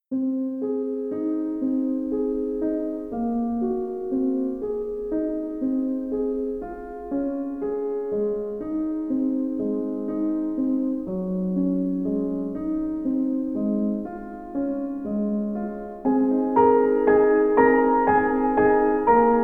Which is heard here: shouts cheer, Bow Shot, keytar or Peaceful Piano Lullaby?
Peaceful Piano Lullaby